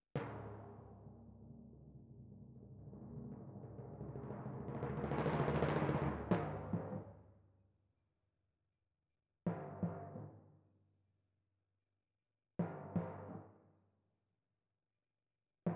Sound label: Timp Close